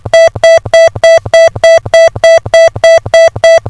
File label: tone